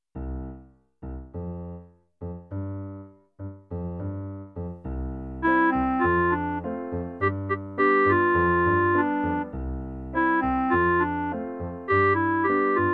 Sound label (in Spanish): CLARINETE